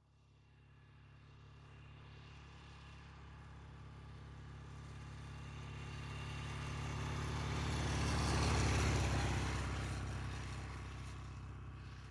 Motorcycle, Norton, Accelerates In, By ( 45 Mph ).